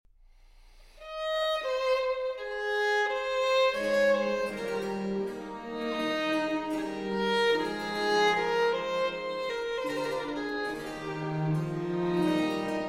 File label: Violin Sonata in A Major, Preludio. Largo